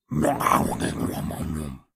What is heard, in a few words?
Dino growls